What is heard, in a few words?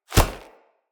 Kick Impact Flesh Armour Hollow; Envoy